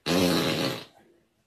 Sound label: very real fart